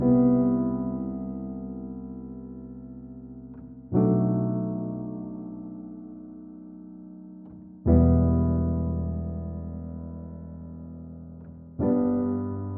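122 BPM Piano Chord Progressions Cinematic Drama G major E minor Tonic G; MMB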